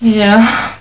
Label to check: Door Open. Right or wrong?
Wrong